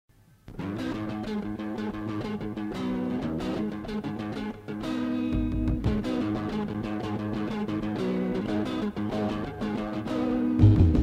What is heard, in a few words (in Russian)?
sladkie zvuki metalla